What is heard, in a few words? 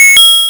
Hard PCM Chip; chiptune